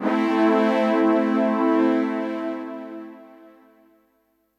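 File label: synth electro 140 A; Techno; Keys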